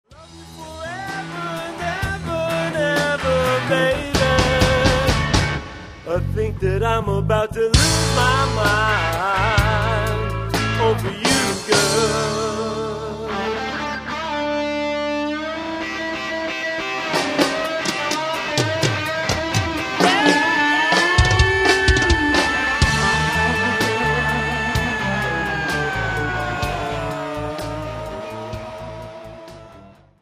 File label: Latin Lady